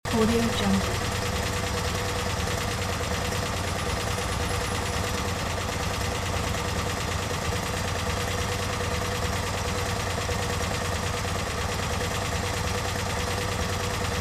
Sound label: maluch car idling loop; fxprosound